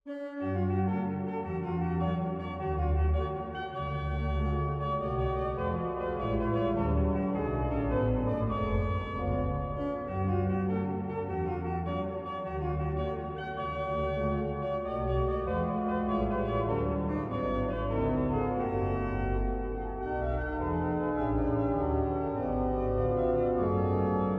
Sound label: Gigue